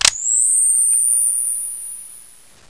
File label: flare on